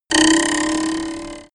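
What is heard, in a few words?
ruler vibrating, toys; comedy-cartoon; spielzeug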